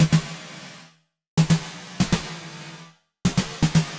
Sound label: Snare loop (120BPM); cinematic drama; Loops; musicradar